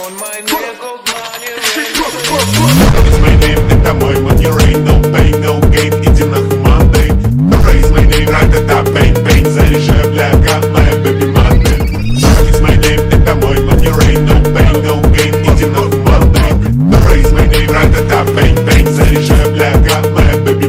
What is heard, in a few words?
money rain phonk remix